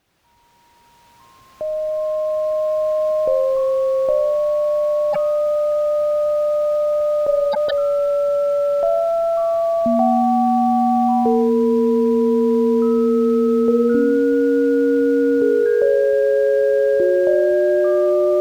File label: rune tone